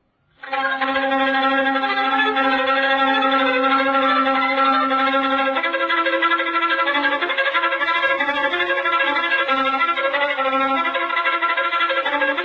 violin staccato

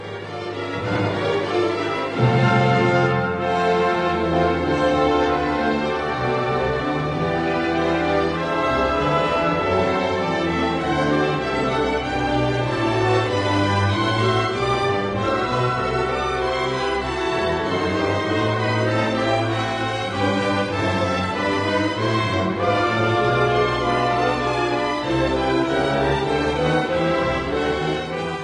wagner overture

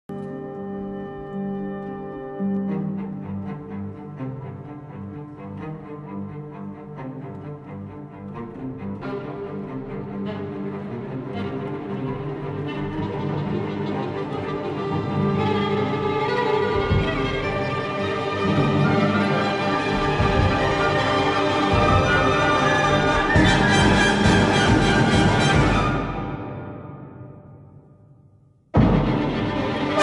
Persian Mysticism

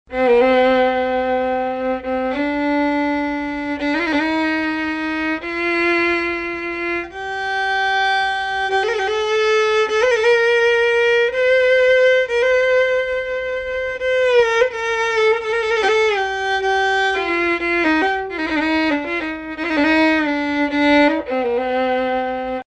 maqam rast on C

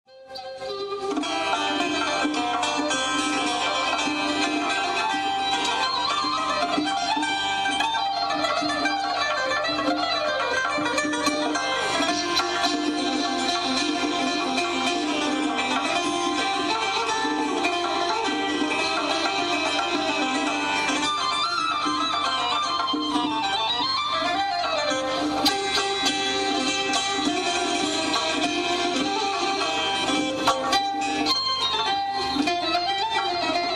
ney; ney e haftband

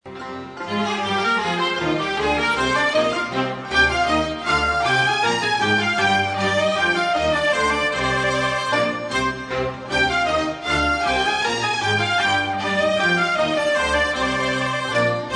Oud Nava; مقدمۀ دود عود (نوا)

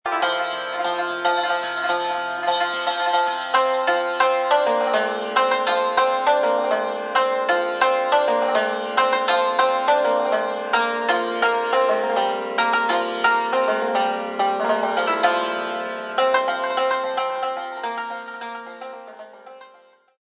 Chand Mezrab Panjgah Rast Panjgah

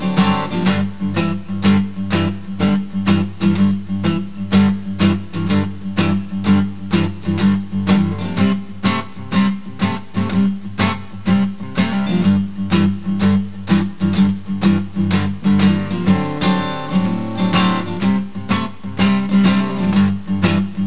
12 bar

